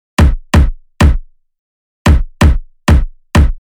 Tom

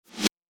Reverse

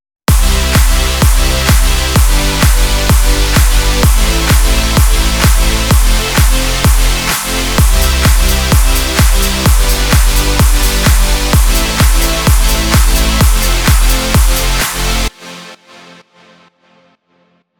Beats

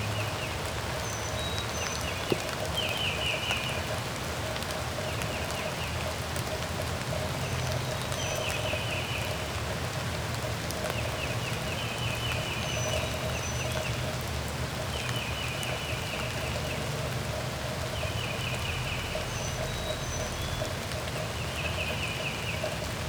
Rain Light; Cymatics

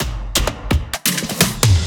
fill

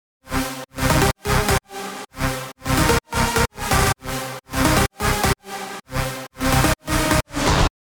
Synth

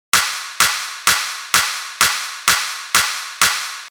Clap

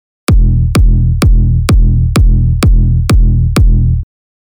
Big Room

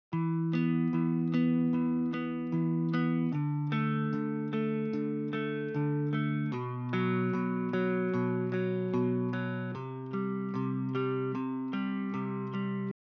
Guitar

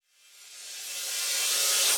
Reverse Crash

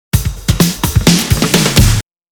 Drum Fill

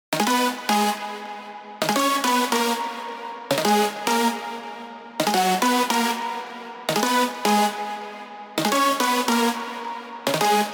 Leads overdrive

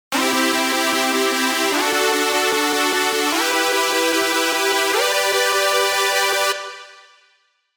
Synth

